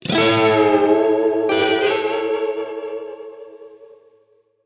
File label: stinger loot box summer epic; heroes of the storm full gamerip 2015